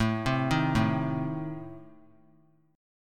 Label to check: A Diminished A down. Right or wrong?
Right